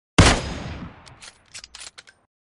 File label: kar98 message tone